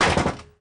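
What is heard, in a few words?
front door close hit, volvo 9900; Freyfurt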